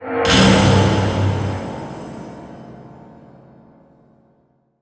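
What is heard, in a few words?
Drum Explosion Stab; Vivisector: Beast Within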